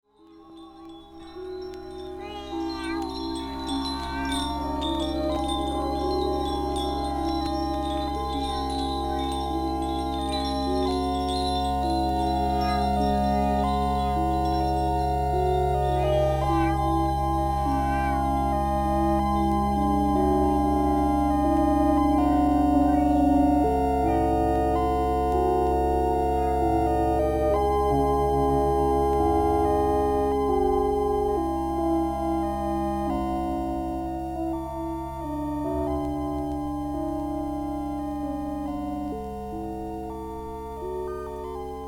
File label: new zealand electroacoustic